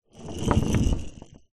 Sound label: household pepper mill wooden large roll on table